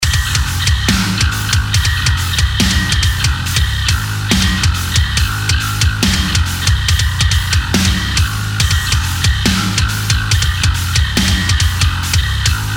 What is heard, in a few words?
bass test, disto bass tone